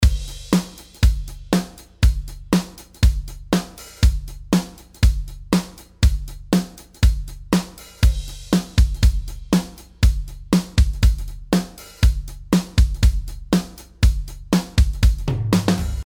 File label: DRUMS Dry